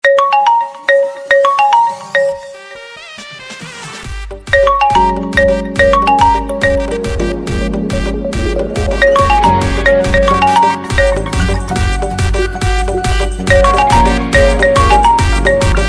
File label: Ringtone; Oppo